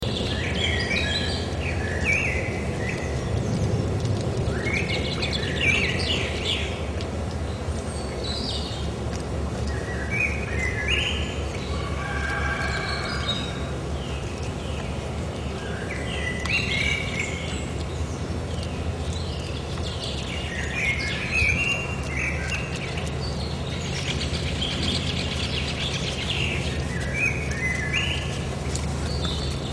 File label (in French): Campagne matin printemps